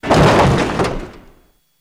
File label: Jeep Chassis Hits Big, hits & rattles